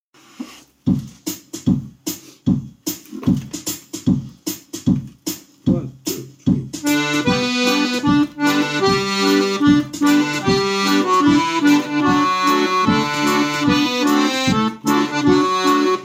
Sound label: with drum; Student Music